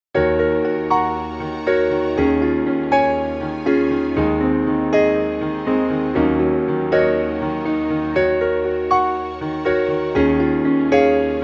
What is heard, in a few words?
Piano and String; PC3